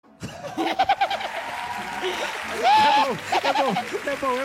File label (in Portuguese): risada pigmeu